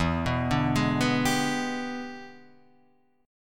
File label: E 7th Sharp 9th, chord; E7#9 arpeggiated; JGuitar